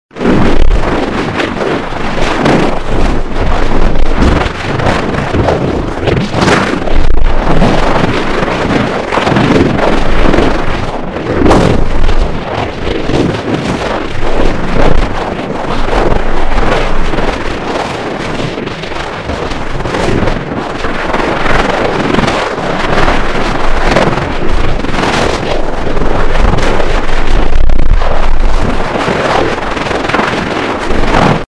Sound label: what pre obe rushing noises sound like